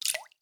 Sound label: Drip